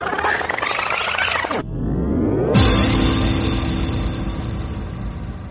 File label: cool soft message tone ringtone, sms ringtones